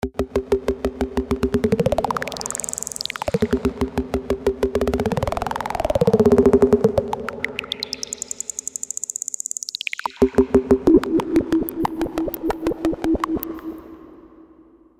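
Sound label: Control Drops, V-Synth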